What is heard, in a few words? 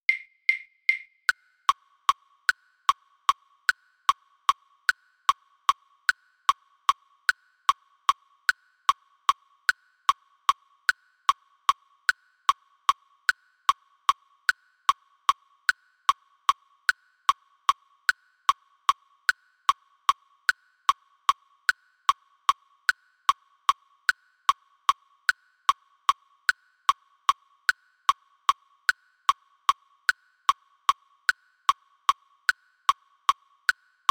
click; destik; fine rain